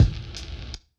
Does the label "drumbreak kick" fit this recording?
Yes